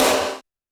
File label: Snare, Roland TD-20